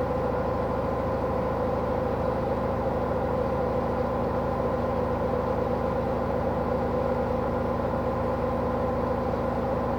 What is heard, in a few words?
Spinning Abnormal bearing, lavadora samsung; minha lavadora samsung esta fazendo barulho; home-appliances